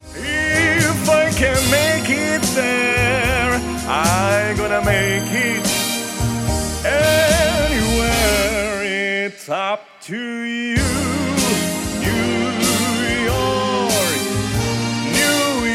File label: New York Vocal